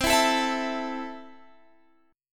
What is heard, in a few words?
C 6th C down, chord; strummed